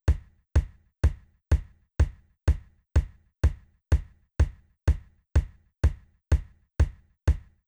Kick No Trans X

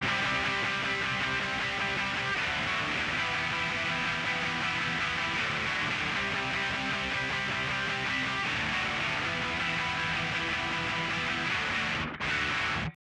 Gtrs EQ Out

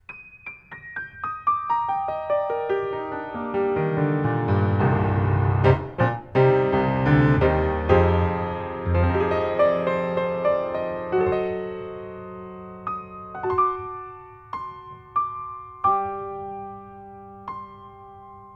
Distance Piano Cls

